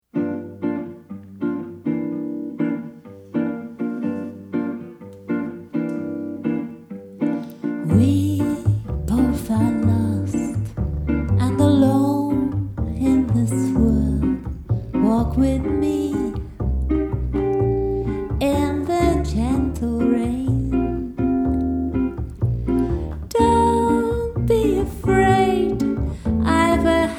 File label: Gentle Rain